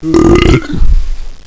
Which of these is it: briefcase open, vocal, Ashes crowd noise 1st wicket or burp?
burp